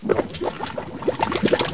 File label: Water, 自然, 水声; 特酷声音素材库